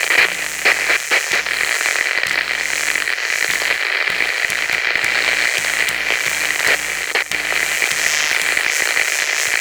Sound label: Broken Screen Loop; Ambience; Props; Warframe; TheNewWar; Zariman